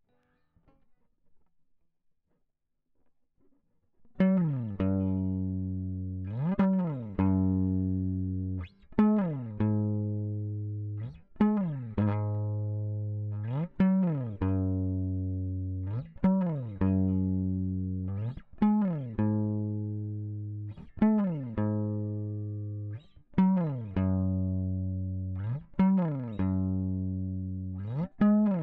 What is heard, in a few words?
Small Bright Combo; logic